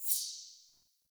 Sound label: UI XP Tick